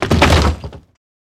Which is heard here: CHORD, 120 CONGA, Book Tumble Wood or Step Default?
Book Tumble Wood